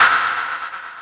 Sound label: step, sfx; anvil